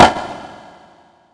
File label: shot; VirtuaTennis